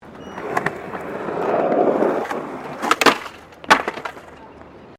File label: SKATEBOARD FLAT JUMP; Skateboarding; Sports